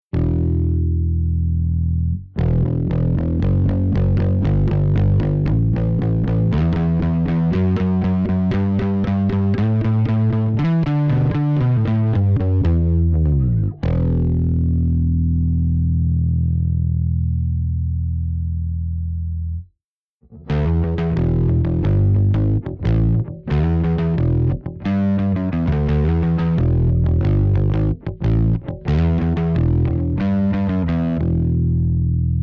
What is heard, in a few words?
PSA1 STANDARD